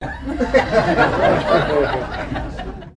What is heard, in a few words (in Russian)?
zvuk tolpa smeetsya